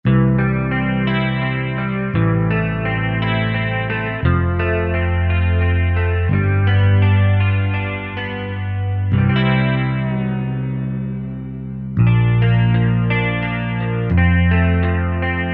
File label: Retro Rock, Kurzweil K2000; Kurzweil K2000 Contemporary ROM